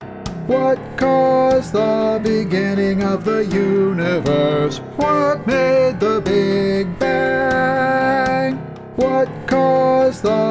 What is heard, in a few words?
tenor bass